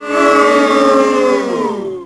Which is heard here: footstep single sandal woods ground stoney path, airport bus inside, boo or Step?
boo